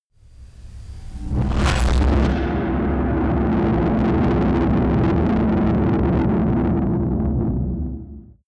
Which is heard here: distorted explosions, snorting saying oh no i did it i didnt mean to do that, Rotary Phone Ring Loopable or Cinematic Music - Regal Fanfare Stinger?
distorted explosions